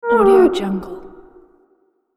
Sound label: asylum horror inmate gagged